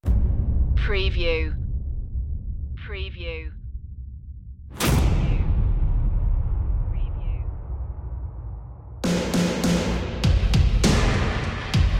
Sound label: genesis drums only